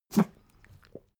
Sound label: pug gag smack medium; natur-tiere; hunde